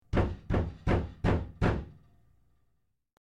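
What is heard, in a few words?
Rapid Knocking On Wood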